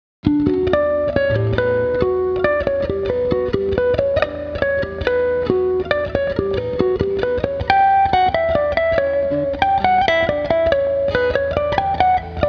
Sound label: Clean Intro